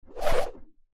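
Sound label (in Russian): свистящий звук взмаха; Звук мечей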